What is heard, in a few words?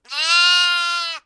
bah